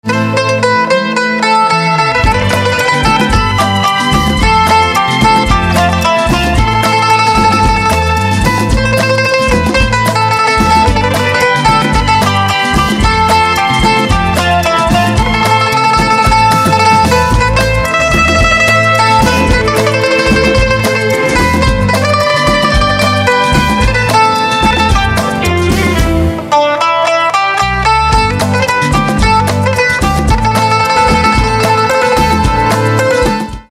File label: Mandolin